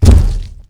forest keeper step; lethalcompany; zerosiki; slender

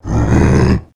alert, male zombie; nmrih; zerosiki; slender

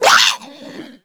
hurt; scarlet; slender

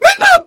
pain sharp

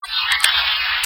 alert

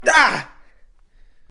hurt; cof; simon

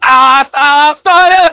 laugh happy; voice packs; scout

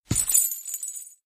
footstep; chriswalker; slender

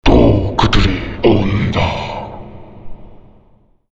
zombi coming

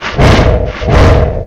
breathing; itsteals; legs; slender

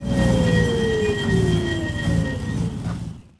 throttle off; tdmcars

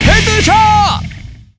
headshot

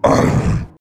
attack, male zombie; nmrih; zerosiki; slender

